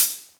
Hihat Open

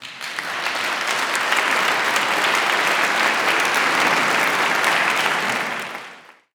clapping; Logi; Loupedeck Sound Pack

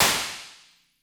Hybrid Plates Plate, Impulse Responses; Ableton Live 12 Lite

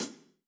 Hihat Closed Stick

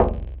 E-Perc Weighty Knock, Electronic Percussion; Drums; Ableton Live 12 Lite; Core Library

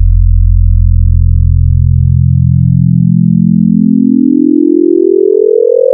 Harmonics Synced Sines; Ableton Live 12 Lite; Vector; Sprites

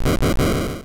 Overworld Explosion; MMBNMMOP

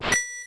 Main Menu Select; Open Zelda; Quest Designer 2.0.4b

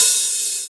Hihat Open; One Shots; Drums

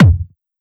Kick Strong Build

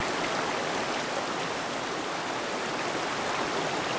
waterfall medium; background

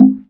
Wood MPC, One Shots Drums

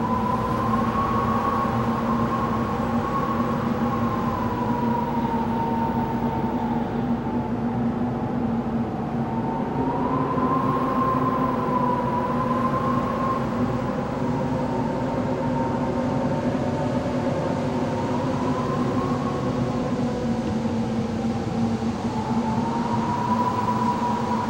Mountain Wind Tunnel After Bridge Quad; Journey

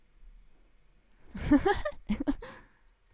Vocal Laughter; One Shots; Ableton Live 12 Lite; Core Library

